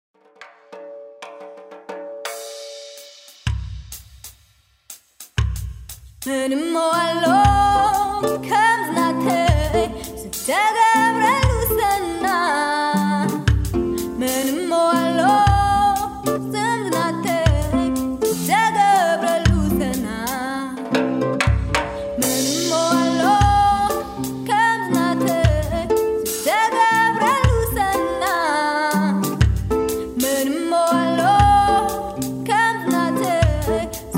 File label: African Gospel